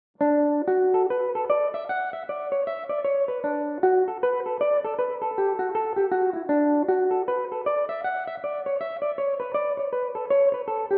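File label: D major belmuse, irish mandolin; The Billy-Doo; original tunes written and played by aidan crossey; Belmuse EM-190 electric mandolin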